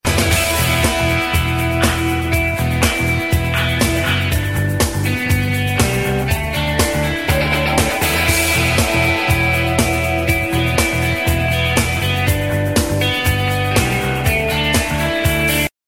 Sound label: ringtone